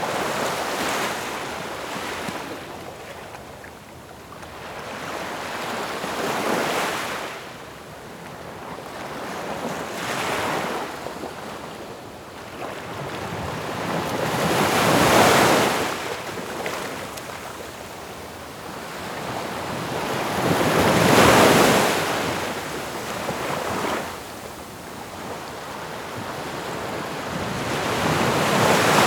Ocean Waves

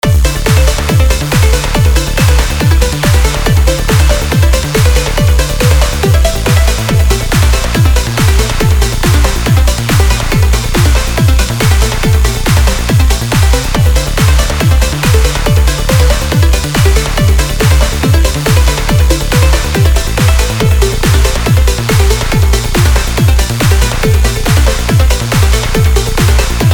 Pluck Pads Arp Bass

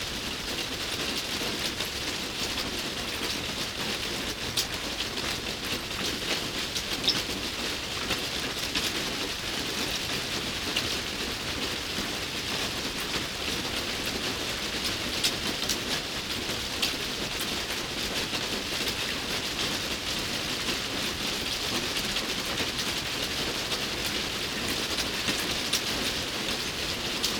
Rain Sounds - Medium Rain; Mother Nature FX; ASMR

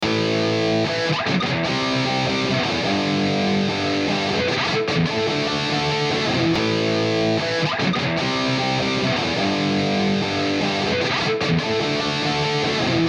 Alternative Grunge Rock Guitar Loop

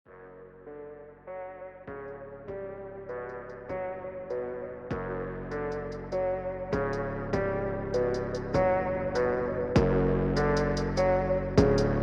Screwed

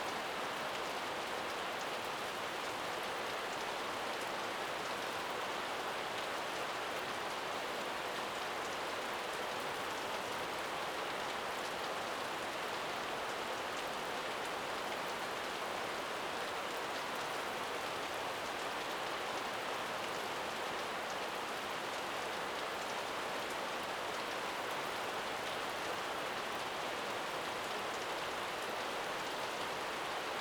Rain Noise Rain on the Tent

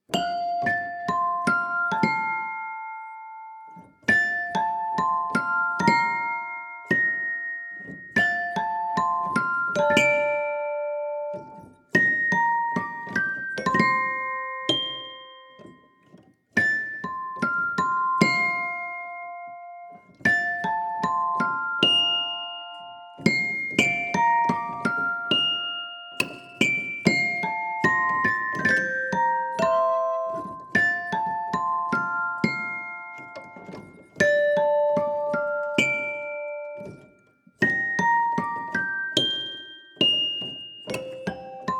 Music Box

